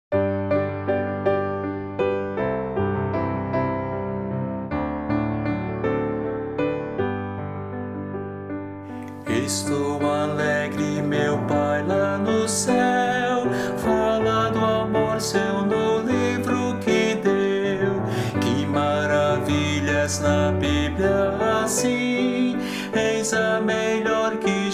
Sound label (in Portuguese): voz piano